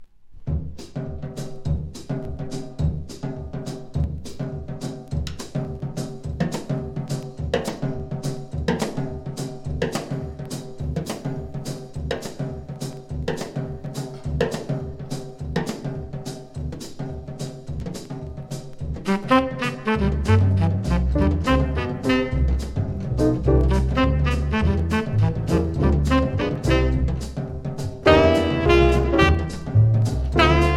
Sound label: SAXOPHONE